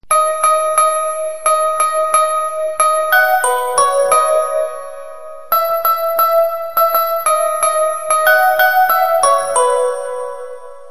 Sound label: jingle bells